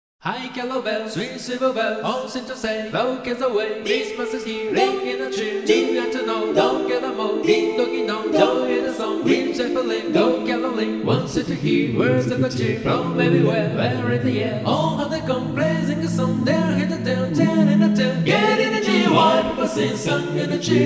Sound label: bells; XMAS